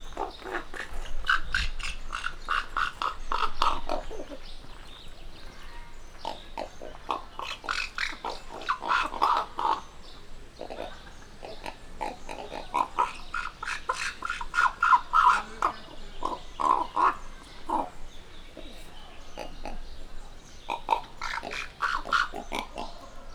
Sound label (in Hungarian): ember nevelte, holló; miskolc zoo